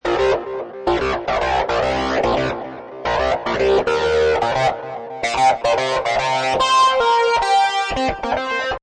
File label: Distorted Guitar Full Chorus Riff A 110, Funk Electric guitar loop in A# at 110bpm; Guitar Loops